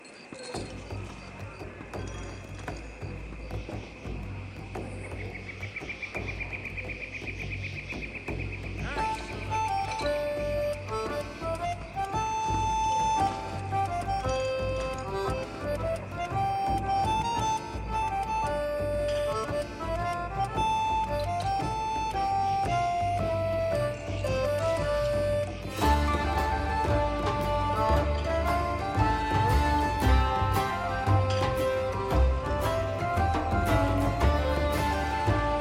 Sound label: tavern; PhoenixCraft-1.16.5; ambience music